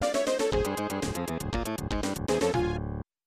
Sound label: Unused Jingle; Yuujin no Furi Furi Girls; snes